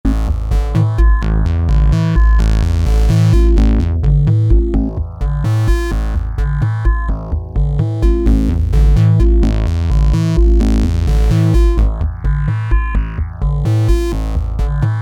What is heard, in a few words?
dark and squelchy; weeklybeats 2024